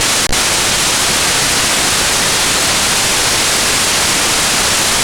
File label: Normal Tv Static